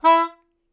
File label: Melodica Short; Short Notes Chromatic